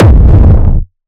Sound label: TECHNO EXTREME RUMBLE FULL KICK; TECHNO PARADISE